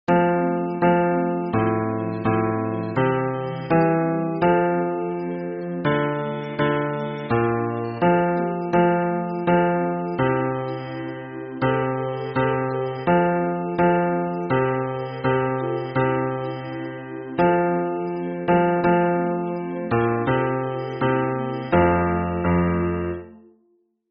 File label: hymn bass